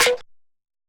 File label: snare